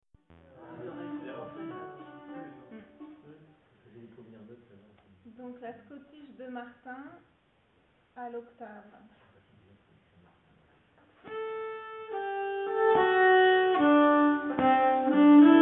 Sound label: Scottish Octave, nyckelharpa; Ernest Scottish Octave